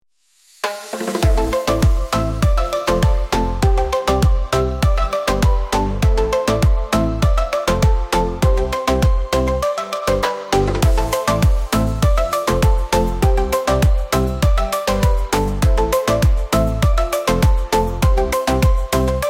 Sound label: sunlit groove